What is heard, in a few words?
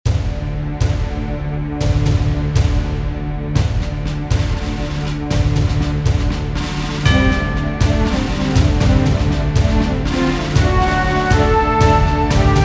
Patriotic & Military